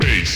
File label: bass